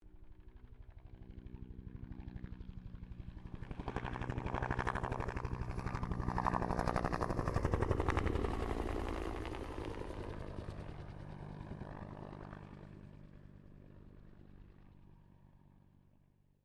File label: HELICOPTER BELL UH1 IROQUIOS PASS; RAN UH-1 Iroguios; Helicopter Sound Effects